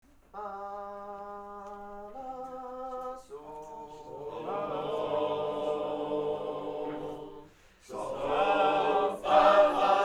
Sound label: Few Happy Matches